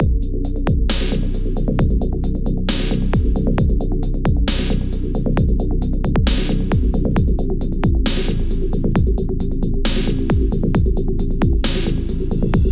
High Score Theme; Epic Win

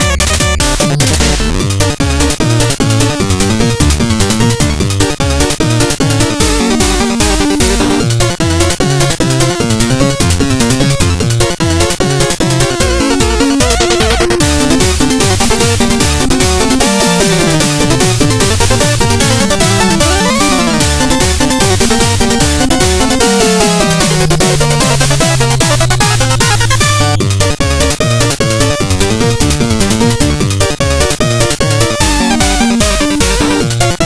Minigame; Shovel Knight; Spin Ye Bottle

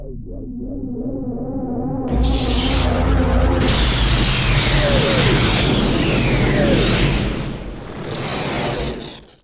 SWING; Flintstones in Viva Rock Vegas; PlayStation 2

